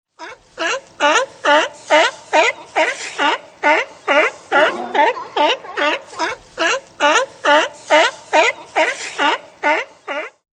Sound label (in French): otarie; loto sonore; pour les petits; loto des animaux